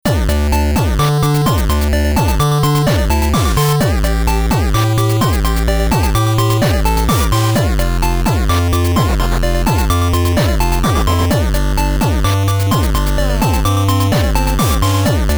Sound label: bit jam; weeklybeats-2016